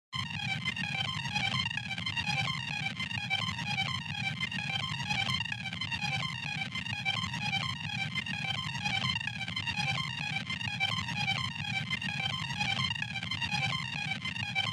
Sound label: rage trap arp